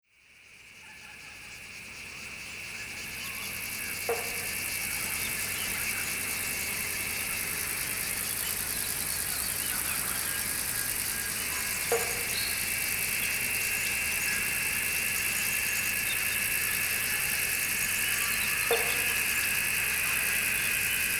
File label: Cicada Summer